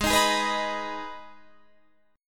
Ab Minor Ab down Guitar Half Step Down

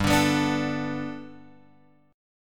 G Major Add 9th chord; Gadd9 Chord {3 5 X 4 3 5} strummed

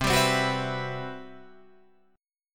C-Major Sharp 11th, Guitar Drop D

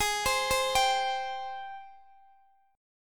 Ab Major 7th Ab down Guitar Open D

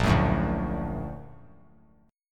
A sharp Augmented Major 7th; A#+M7 strummed; JGuitar

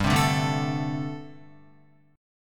G Suspended 2nd Suspended 4th, Guitar; Gsus2sus4; strummed

